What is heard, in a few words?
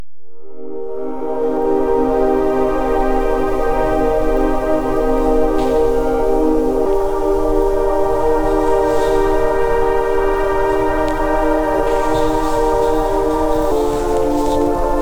Rain song